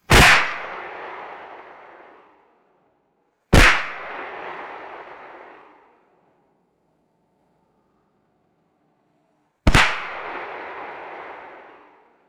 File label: three loud echoing firecracker pops; fireworks